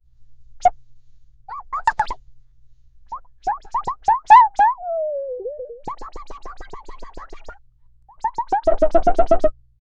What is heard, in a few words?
an imaginary creature mad, goo